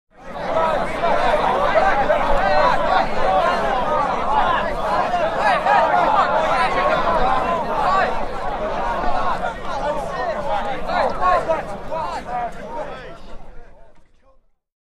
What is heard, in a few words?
Infantry Deserts, infantry walla